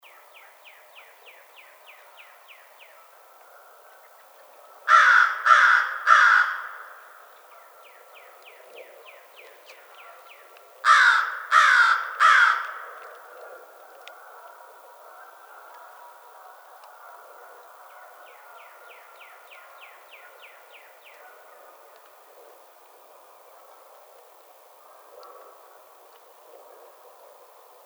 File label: American Crow